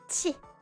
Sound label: "Tsk"; noises